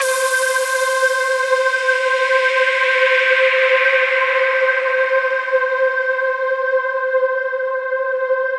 VOICE PAD; Masterbits; Vintage Voltage

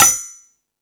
Rap Session, E-MU Pro-Cussion

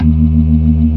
ORGAN, C3 NO PERC; Keith Emerson Signature Series

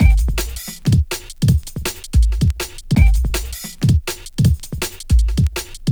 Oo-Yeah, Loops, breaks; m8 breaks

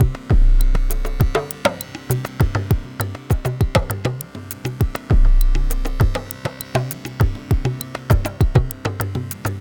Ethnotronic, Processed Percussion; Sonic Foundry (Sony Creative Software); Rhythmicronics

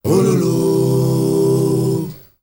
MALE GRP, M. CHOIRS, Heart of Africa; Spectrasonics; Heart of Africa 2

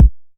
909 BD; Goldbaby Tape808 & Tape909; The Tape 909

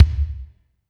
Kick, Alesis DM5

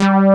RESO ANA BS, BASSES; Pro-Rec - Synthsonic

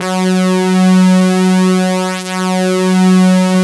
SYN, Roland JD 800; Sound & Vision - Gigapack I CD 2; JD 800/990

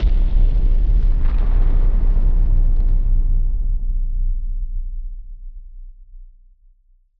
Hit Splosion; musicradar; bomb factory

